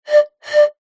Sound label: musical pipe metal blow over top whistle tuned